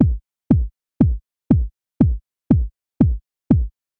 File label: Kick; hydrogen